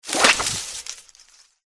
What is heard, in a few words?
flower attack voice mid